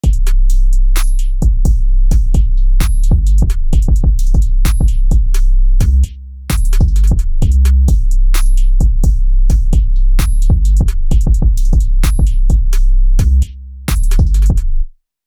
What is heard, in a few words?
Drum Kit